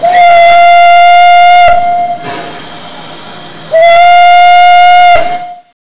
TRAIN; psion cd vol1

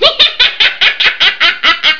IMP LAUGH

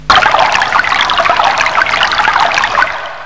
STREAM

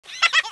Mouse Laugh; psion cd vol1

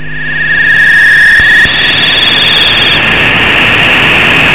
UFO; psion cd vol1

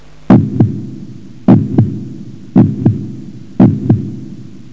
HEART; psion cd vol1

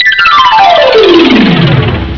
PIANO

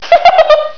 LAUGH

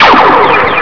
THUNDER

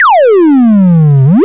Tschuuuuiip

